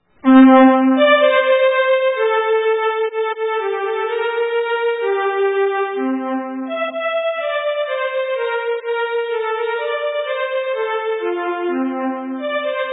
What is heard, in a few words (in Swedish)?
polskan